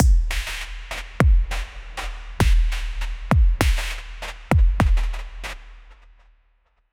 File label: downer sound